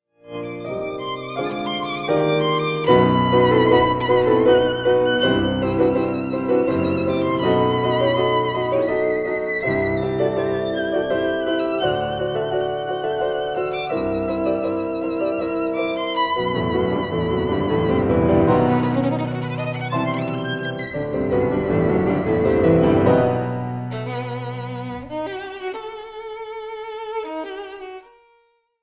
sonata for violin and piano no 2 op 82 espanola 3rd movement; nash ensemble; adagio